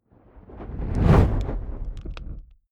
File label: fire whoosh crackling soft; swooshes-swishes